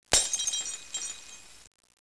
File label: glass